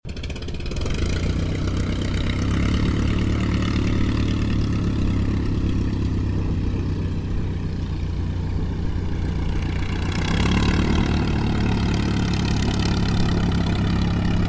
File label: aircraft dh82a dehaviland tigermoth taxi stereo